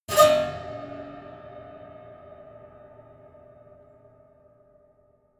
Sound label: piano squeal